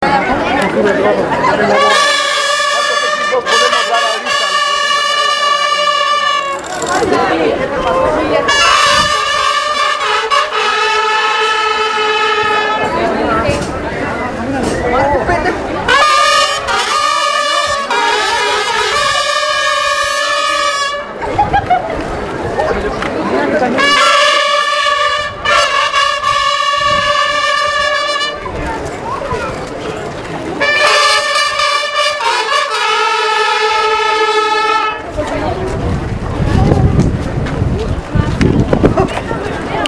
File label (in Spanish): trompetas fanfarria; juicio y crucifixion de jesus de iztapalapa